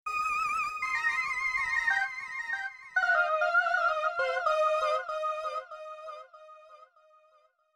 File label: Fat Lead